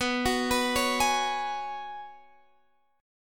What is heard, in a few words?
F sharp Suspended 2nd Suspended 4th B